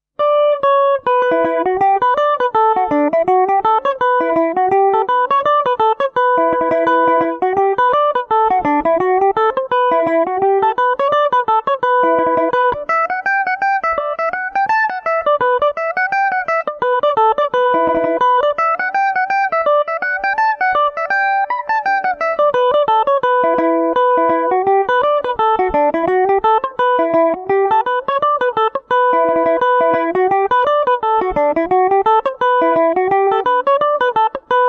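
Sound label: E dorian, Irish mandolin; game of love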